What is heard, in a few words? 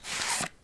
card Take Out Package, Casino; Kenney's Sound Pack